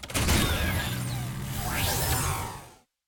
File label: collect Symbol Win; netent; multipliermayhem